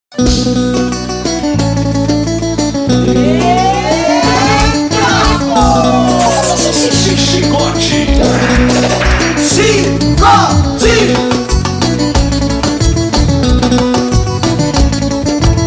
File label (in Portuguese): forro